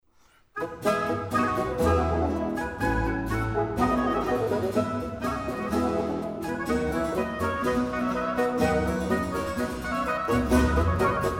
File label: Rigaudon; Telemann